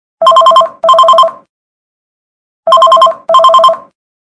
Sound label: ringring; KU310; Default sounds; LG